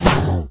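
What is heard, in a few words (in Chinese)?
Bear atk, 熊灵布鲁斯攻击音效; 妮塔; 沙漠世界; 荒野乱斗; 熊灵会用爪子攻击对手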